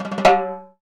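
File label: TIMBAL; Univers Sons; Basicussions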